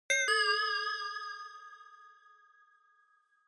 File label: Inspiration Low; Onoma